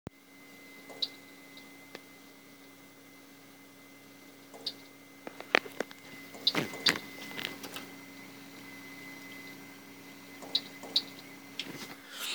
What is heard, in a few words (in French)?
vocal